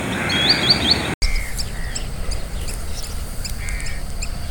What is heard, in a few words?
bird audio